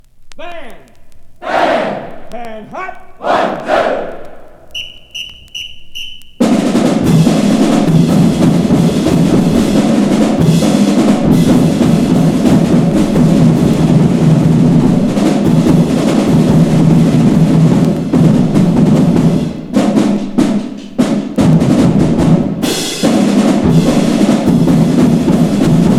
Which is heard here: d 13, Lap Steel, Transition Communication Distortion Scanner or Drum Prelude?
Drum Prelude